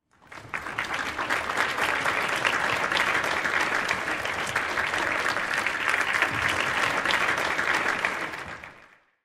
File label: Applause